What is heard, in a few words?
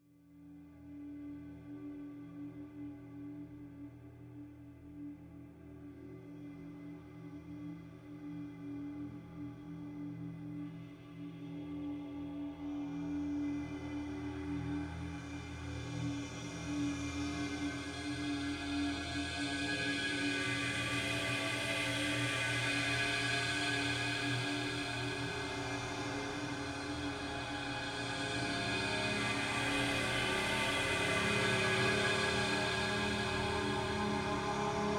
Drone Evolving Guitar Swell; Justsoundeffects; Cinematic Drones